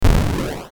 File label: explosion; mines